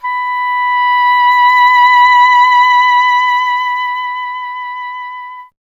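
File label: Wind OBOE B; Miroslav Vitous - Solo Instruments VOL-2; Solo lnst. II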